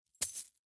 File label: payout